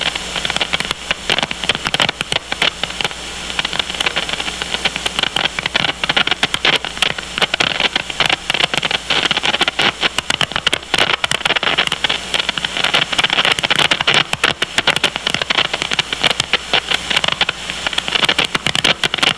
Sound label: jupiter s burst; ufro